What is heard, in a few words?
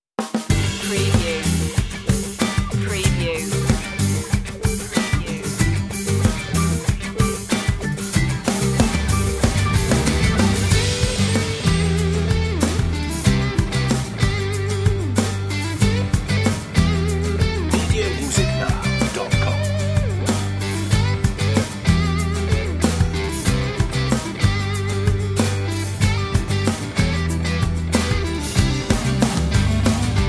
crash guitar